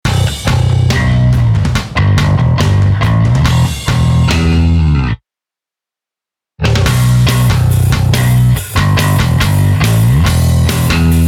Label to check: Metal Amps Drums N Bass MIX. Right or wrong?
Right